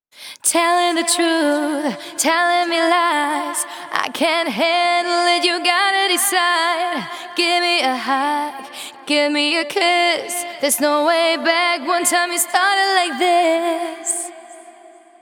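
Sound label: Female Vocals Verse WET, 140 BPM; Vengeance Samples; VTDS2 Song Kits; Female Take Me Away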